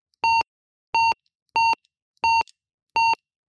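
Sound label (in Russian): звук домофона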